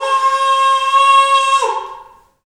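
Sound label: Wind Bullhorn, Afro Horns; Roland LCDP11 Africa VOL-1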